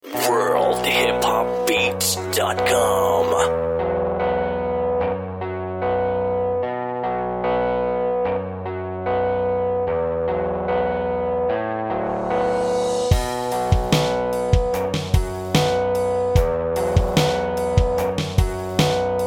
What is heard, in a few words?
74bpm